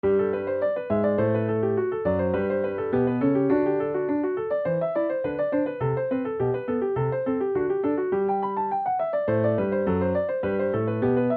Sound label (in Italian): Allegro